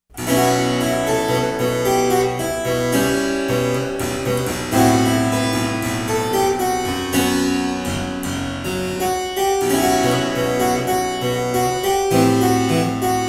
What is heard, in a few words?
Bossa Nova, harpsichord